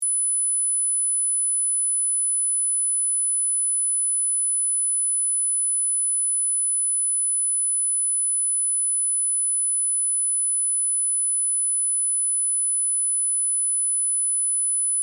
10000 khz

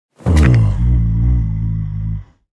grunt; huntsman

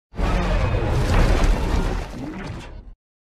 turbo kick; kaiju universe mechagodzilla

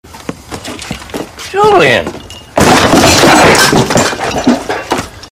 falling, stumble; jim lahey

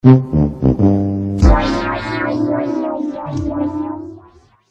losing horns; tpir

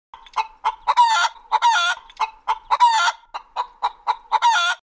hens chickens

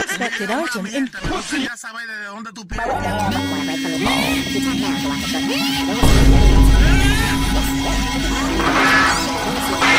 meme sound effect mashup

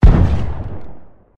clean pump sfx

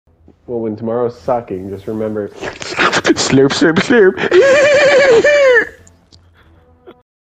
slurp slurp slurp hehehehehehe

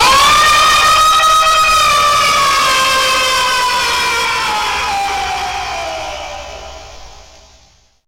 jumpscare sound; fnaf plushies 2 v4

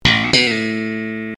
sitcom transition bass riff; seinfeld

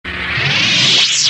lightsaber off

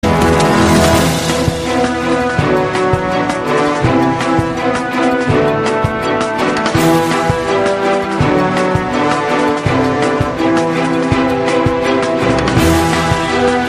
battle music; yu gi oh millenium

